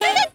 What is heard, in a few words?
Hit Clown